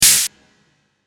Lotsa Noise

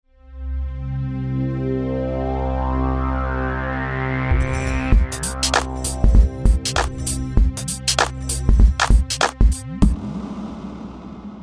Atmosphere, stingers

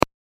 Sharp Attack

